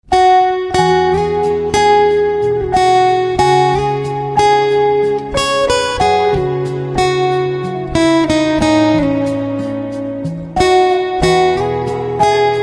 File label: Guitar Instrumental Ringtone